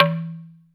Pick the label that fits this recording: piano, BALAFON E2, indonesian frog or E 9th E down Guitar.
BALAFON E2